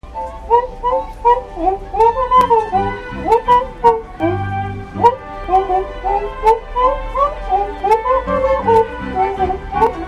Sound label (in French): cuica classique